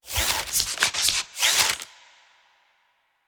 Pack Shuffle; Yu-Gi-Oh! Master Duel

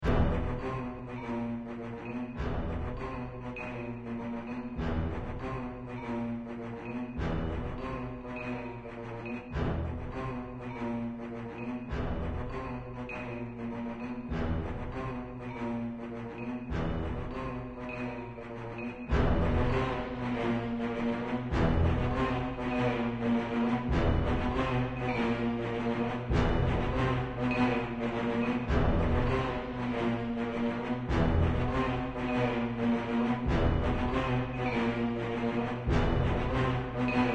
Music Puzzle Loop

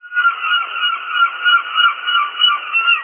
Gulls; Buggy; PS1

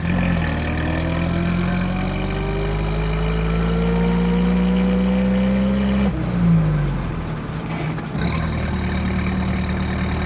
Car Loop; android; Does Not Commute

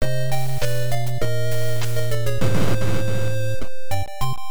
Battle Mode Loss; Choujin Sentai Jetman; nes

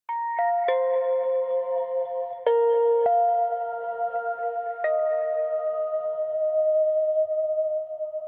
Tension Fill

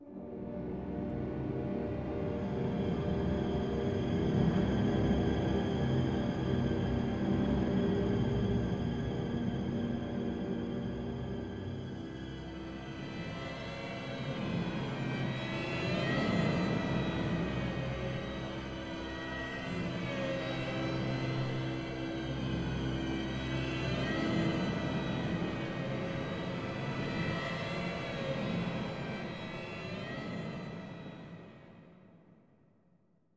Riser; N.O.V.A. 3 Near Orbit Vanguard Alliance

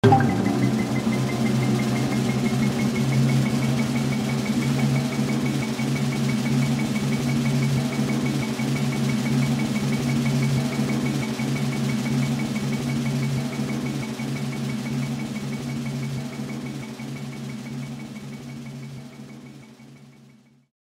Drum Roll

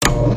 UI Confirm In; WWE All Stars; Xbox 360

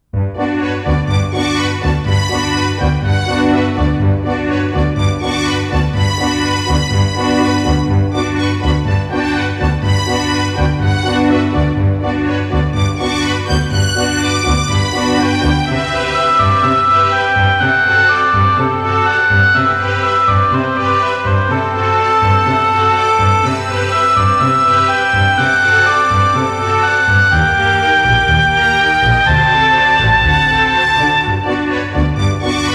Synthesizer Version; Super Famicom Magazine Volume 12

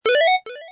Button Press; Shop Empire 3

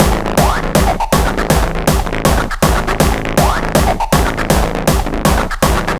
Beats Loop; Anger Foot Demo; Angerfoot Beats 12

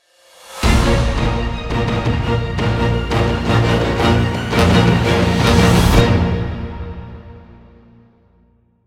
Short Epic Logo

